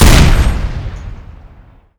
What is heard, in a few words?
new m240b; garrysmod